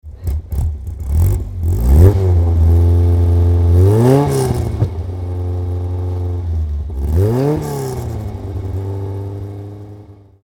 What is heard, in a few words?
Alfa Romeo 1750 GTV, engine